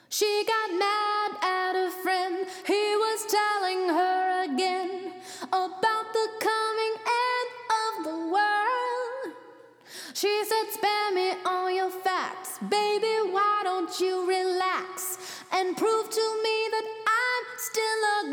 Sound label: Vocal Plates Vocal Shimmer; Seventh Heaven Professional